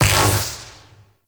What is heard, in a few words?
Vial Ability Magnetic Shatter; Warframe; Warframes; Alchemist; Elements